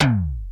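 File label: electronic tom, electronic drums; Crypton - DrumBase5000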